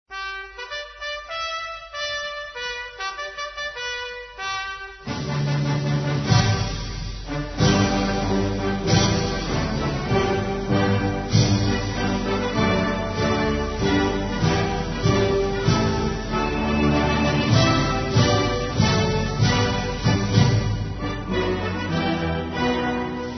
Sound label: interval signal